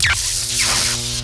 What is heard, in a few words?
venatu attack